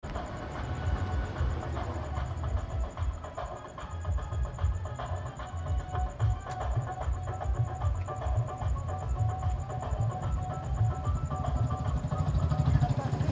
drums distant drums, South India; South India street recordings

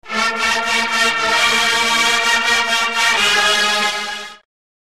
fanfare very short

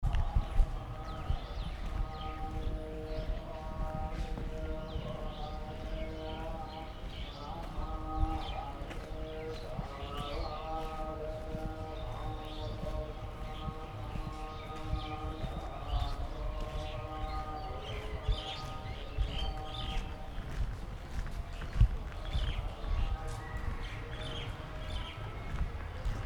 morning chants, North India

